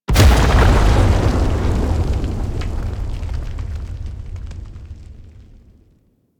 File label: Wyrm Debris Impact; Enemies; Warframe; Infested; Microplanet Sun Monster